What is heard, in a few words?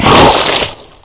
bullsquid attack; hl2